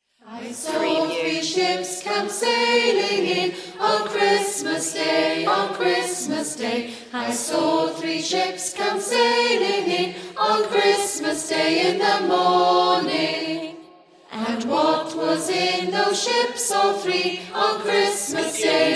i saw three ships choir

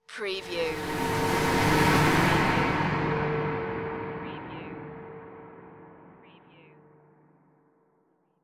spacecraft flyby drone

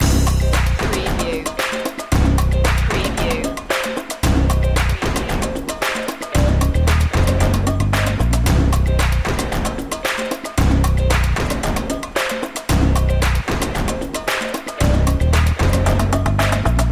rhytmic soul loop

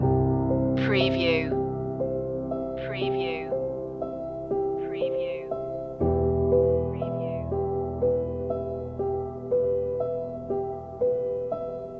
flowing emotions loop

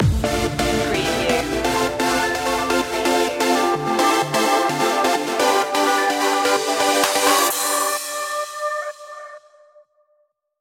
trance elevation stinger

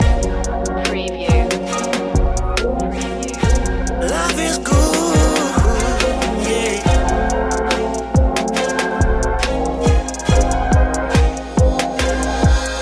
smooth soul vibes loop

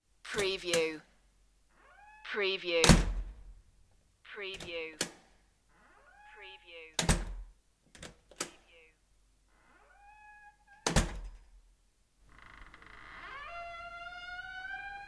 metal door

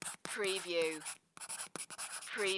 Pencil Write On Paper

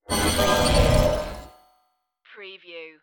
stargate dimension opening

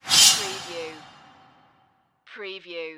Whoosh; Sound Effects Bible

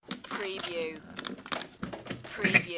Nagra Tape Recorder

